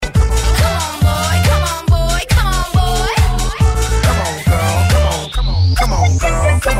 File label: loading tunes; dod